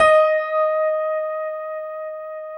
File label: PIA MICRST, MICRO-PIANO, Roland; Sound & Vision - Gigapack II